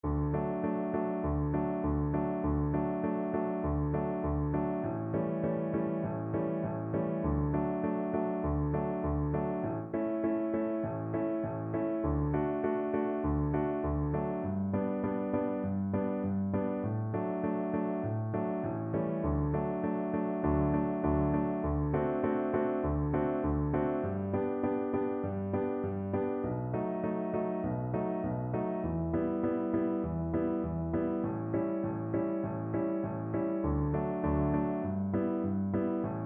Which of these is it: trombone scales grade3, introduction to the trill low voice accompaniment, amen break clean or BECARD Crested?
introduction to the trill low voice accompaniment